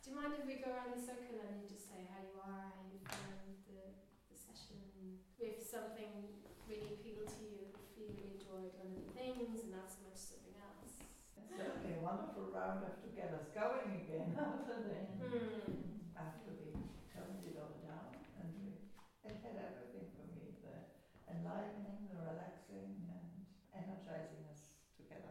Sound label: Chant Workshop Feedback